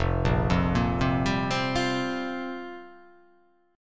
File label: F Suspended 2nd Suspended 4th F down